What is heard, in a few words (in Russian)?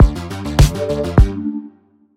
сбивка; audiobrending dlya podcasta